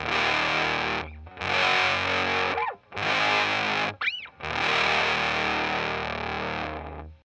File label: neck rhythm